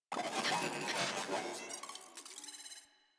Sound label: Moa Vocal Idle; Enemies; Warframe